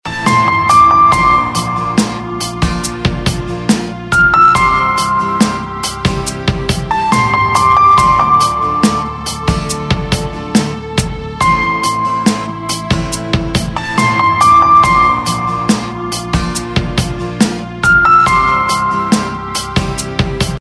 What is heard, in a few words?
Instrumental Ringtone; Bollywood Hits (2015)